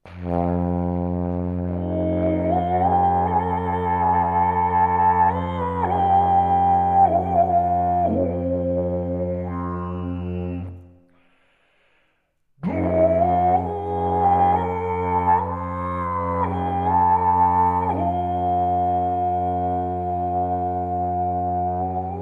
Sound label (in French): melodie voix, alphorn